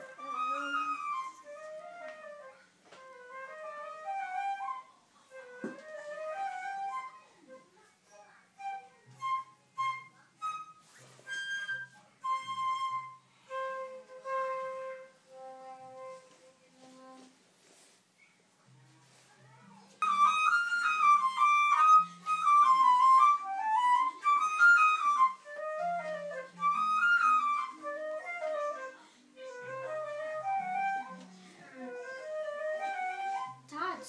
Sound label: flute practice